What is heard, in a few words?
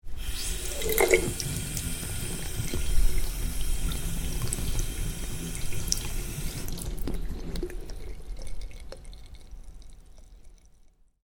Tap sink, Tap: Water Runs Into Sink Then Drains Away; Bathroom; Household; Stuart Duffield